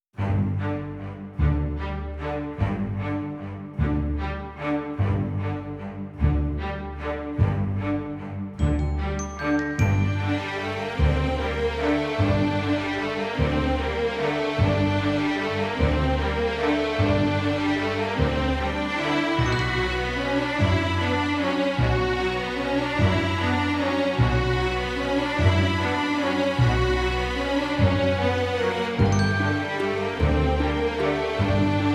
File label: enigmatic; By Burak Sound Bank; epic tema paketleri